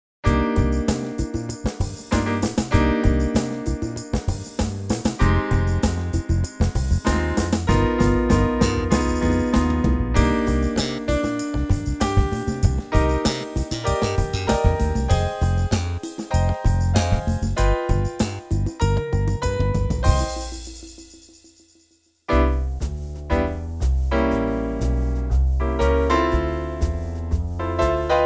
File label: Bass Splits